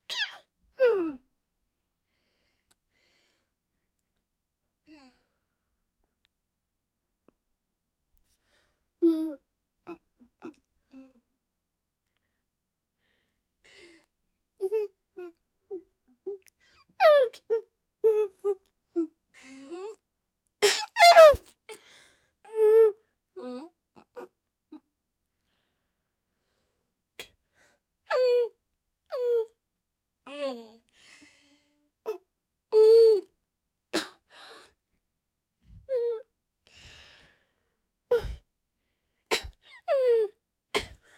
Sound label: girl grunting after being